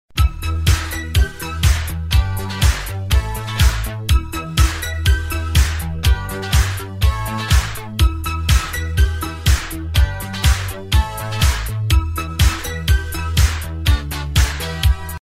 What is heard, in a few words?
get up action